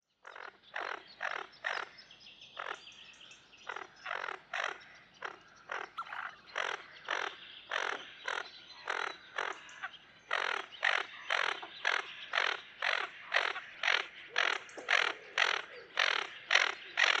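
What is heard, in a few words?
tufted duck aythya fuligula; hohlataya chernet; utok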